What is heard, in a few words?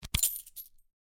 open store; League of Legends; lol air client